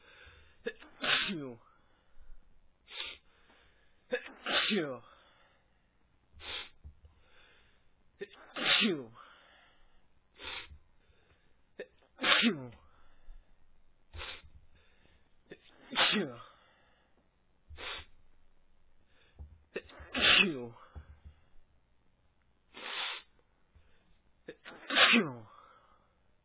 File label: sneezy sneezy, male